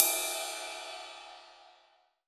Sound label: MIX1 RIDE, MONO RIDE